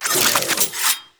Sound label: Finisher Parazon Unequip; Warframe; Kuva Lich; Finishers